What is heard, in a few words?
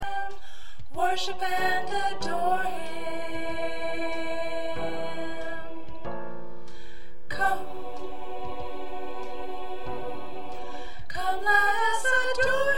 Soprano